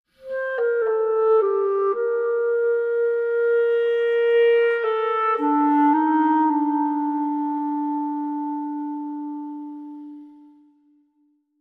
Only Clarinet At The End

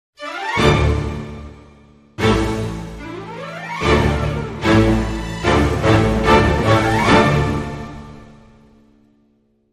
Dramedy Sting

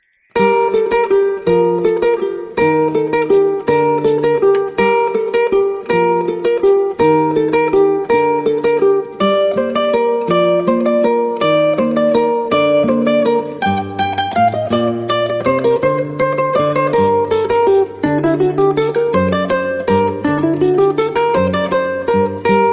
Carol Of The Bells Ukulele Bells

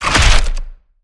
Giant Crunch

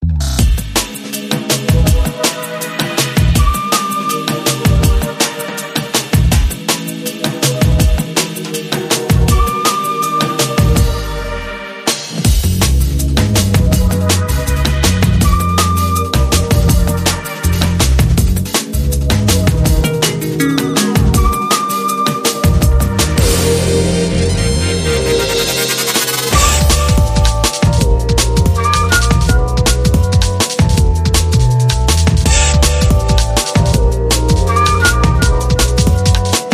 Nu Drum Old Bass Full

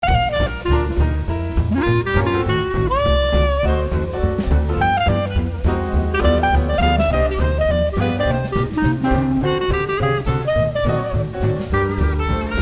Hot Swing, Theme Only

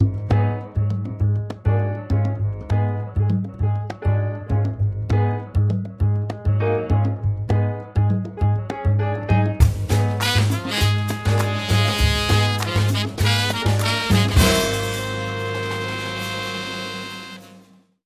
Come for the Jazz, Sting

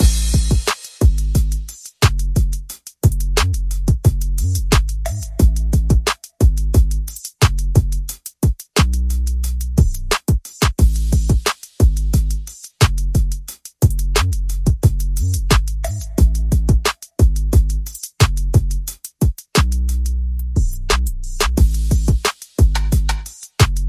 Percussion and Bass

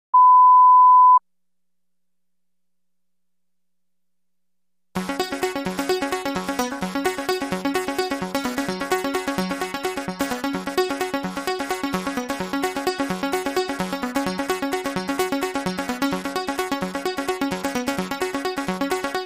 Di Di Dah Dit, Synth

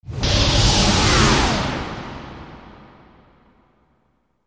Turbo Drive

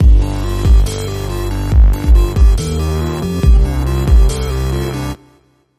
Dubstep, Sting

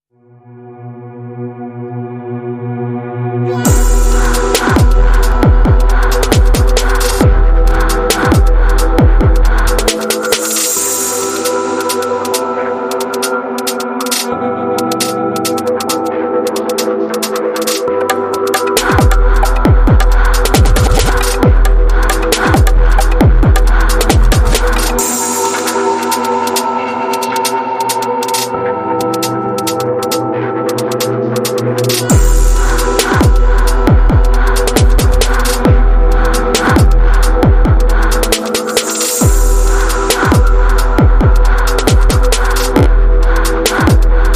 Glitch Step

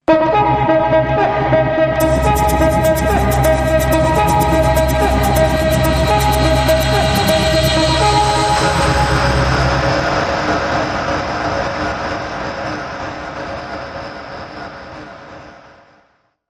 Cuica Sting

